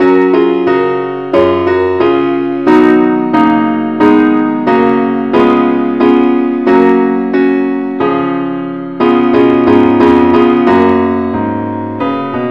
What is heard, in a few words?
keyboard Only key fis; Welsh tune; Gwalchmai is a Welsh tune, named for the town of Gwalchmai